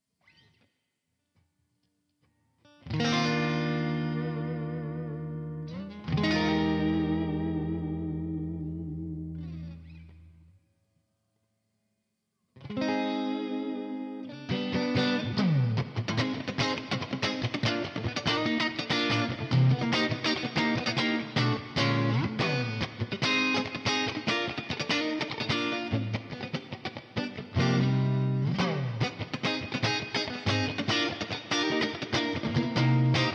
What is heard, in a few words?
EG Rhythm; multitracks; 149 bpm